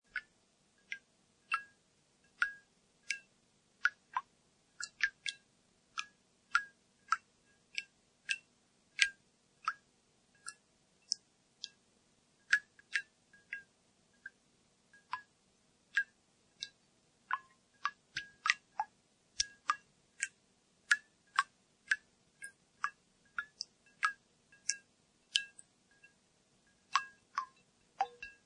Water Drops

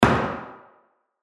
Toilet Stall Door Close; Office; Blastwave

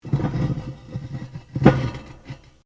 METAL ON METAL LARGE SCRAPE; Materials

